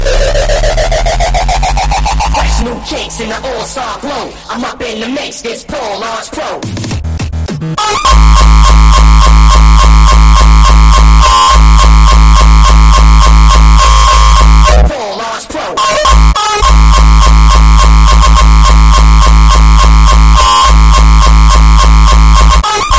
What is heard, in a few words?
hardcore